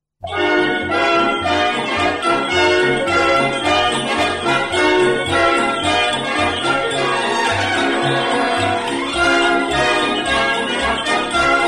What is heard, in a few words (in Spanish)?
cascabeles